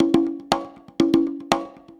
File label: 120 BONGO